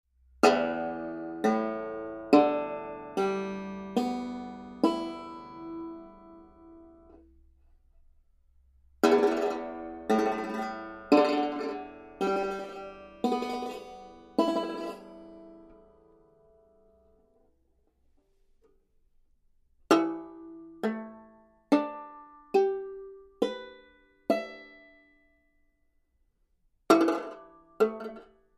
chop stick hackbrett zwei, banjo

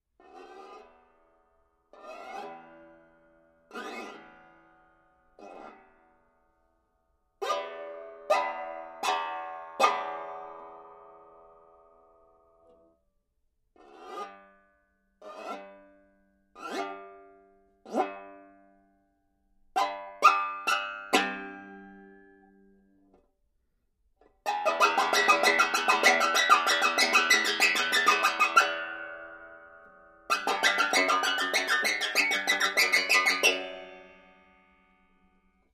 kratzen, banjo

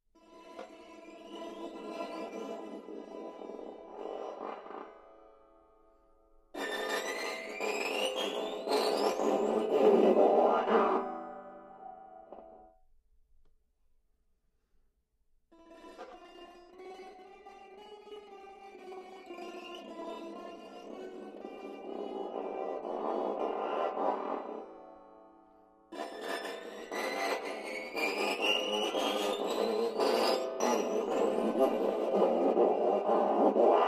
gewindestange, banjo

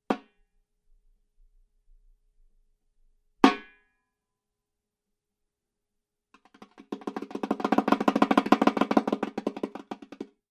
trommel secco, banjo

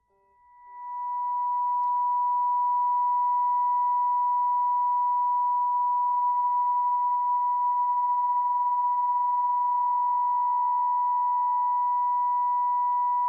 e-bow pfeifen, banjo

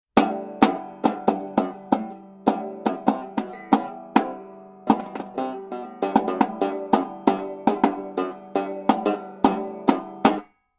tapping und trommeln, banjo

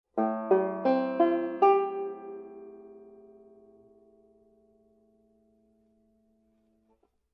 viertelton akkord, banjo

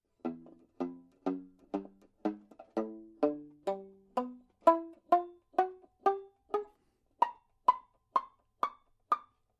pizzicato, banjo

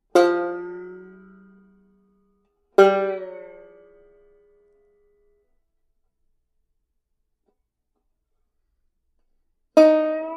bending, banjo

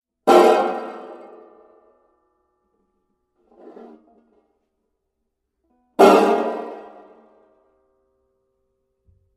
bleistift, banjo

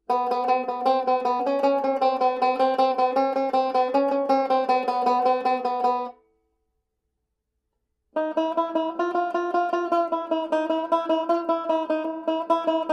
vierteltoene, banjo

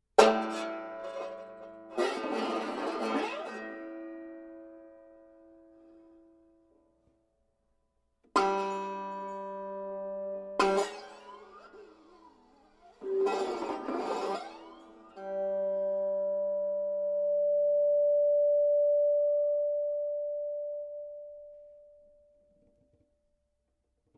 e-bow schlag, banjo

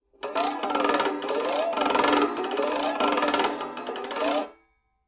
bottelneck guiro, banjo

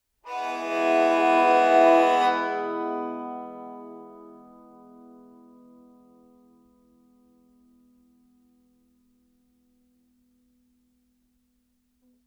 bogen, banjo

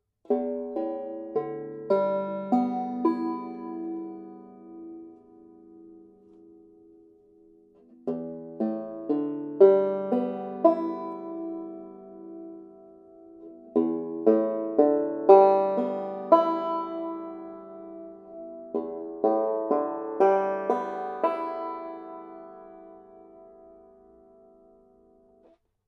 leere saiten finger, banjo

